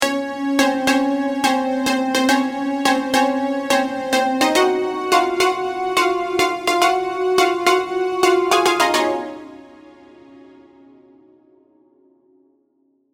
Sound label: serum synths; chiqui audio